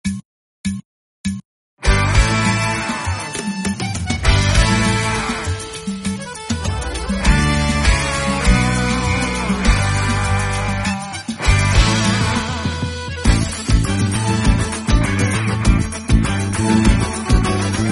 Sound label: jingle